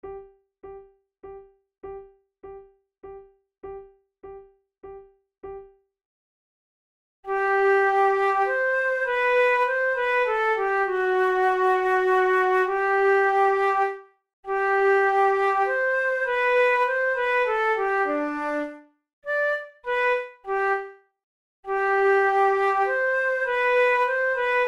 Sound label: methode de flute; tulou